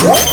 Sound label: defuse spike